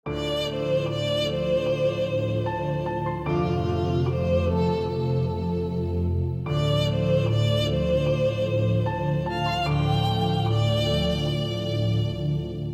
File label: BGM